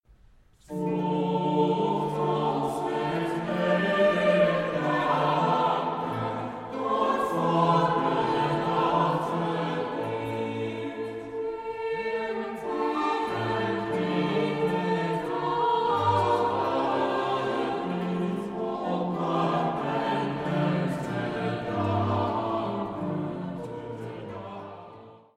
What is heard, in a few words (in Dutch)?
Koraal; Psalm 92 2; “’t Voegt ons met blijde klanken”